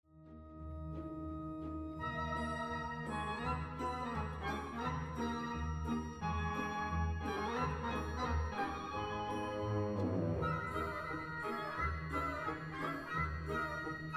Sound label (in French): glockenspiel cymbalum